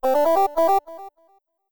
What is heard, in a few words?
win; OneShot Fading Memory